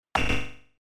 UI invalid sound

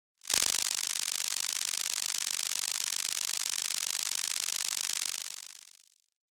bug flying

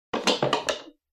wood material

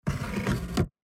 wooden sliding door

